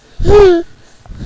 BABY CRI

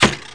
DART, WEAPONS

